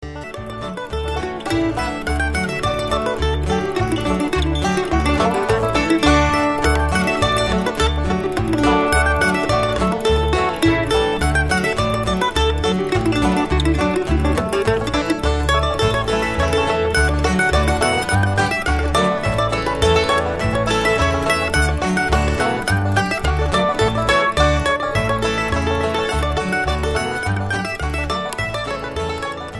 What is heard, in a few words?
bluegrass